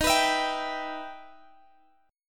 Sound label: E Minor Major 7th Eb